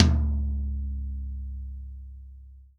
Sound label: TOM, Real Drum Kit, POP KIT